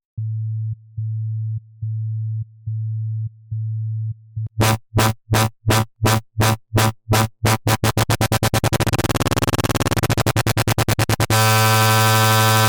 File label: bend